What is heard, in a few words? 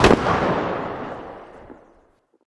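mag7 distant, gun; arccw go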